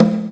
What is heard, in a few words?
CONGA LOW; E-MU Formula 4000 Series Vol. 2